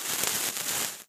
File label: thermite sparks; weapons